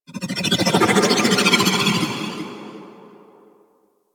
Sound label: Mountain Pass Sculpture Contract; Warframe; Ambience; The War Within